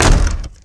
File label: door wood close stop; Spearhead Demo